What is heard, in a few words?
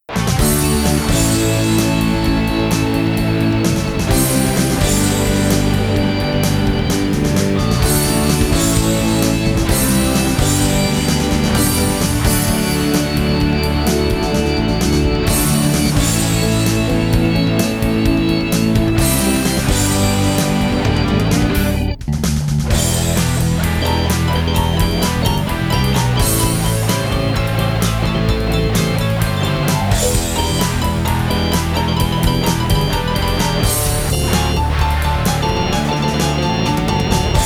music instrumental major minor melody